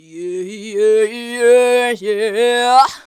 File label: VOX, Singin